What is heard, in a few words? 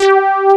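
resonant analog bass, JV 1080; Pro-Rec - Synthsonic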